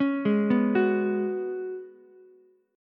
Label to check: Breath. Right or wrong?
Wrong